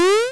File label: jump